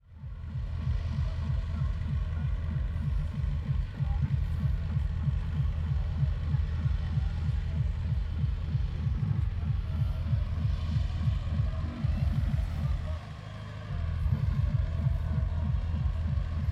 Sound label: Parking in rave party